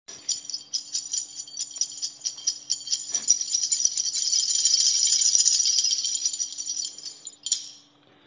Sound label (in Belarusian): жаночыя бразготкі